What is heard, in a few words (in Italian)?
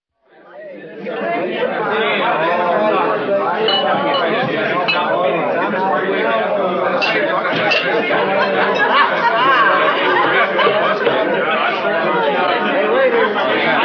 Rumori taverna